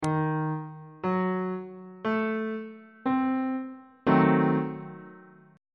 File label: a diminished 7th 2nd inversion on bass clef